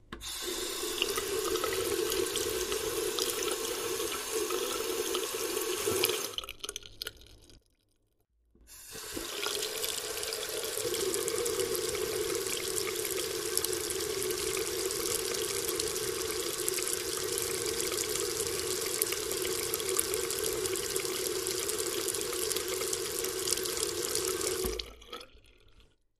Wash Basin Porcelain

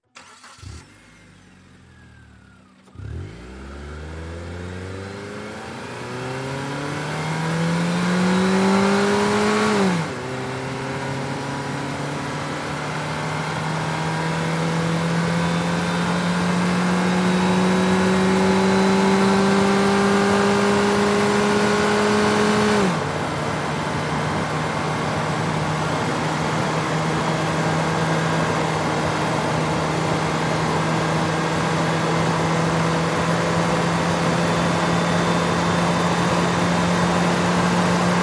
Sm Car Accel 100mph, compact

